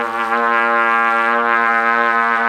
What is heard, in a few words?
trumpet Bb2